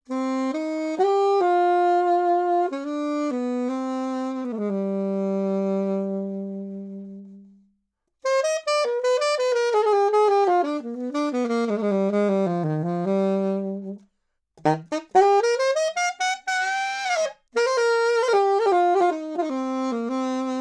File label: Sax Alto 20cm Low